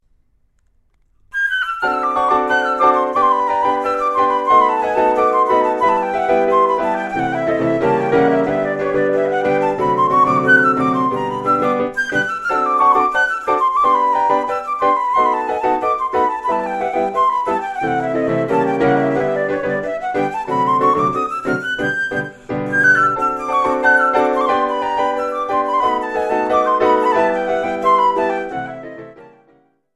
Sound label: Piano Flute